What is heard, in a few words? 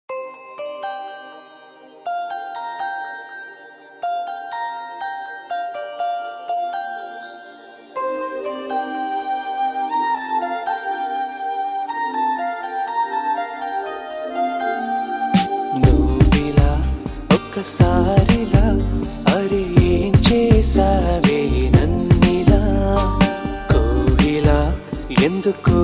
bgm Ringtone; manasara